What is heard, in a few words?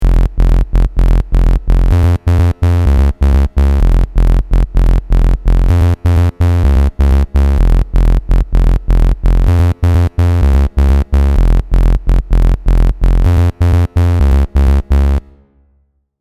bass aliasing free Osc